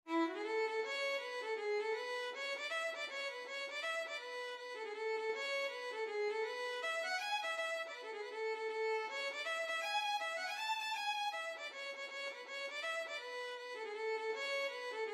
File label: violin